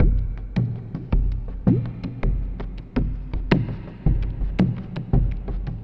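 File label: PROCESSED AFRICAN TALKING DRUM; Sonic Foundry (Sony Creative Software); Vir Unis Infusion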